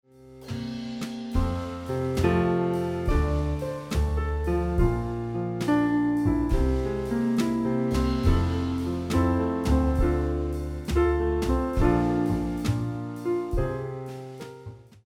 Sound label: Accompaniment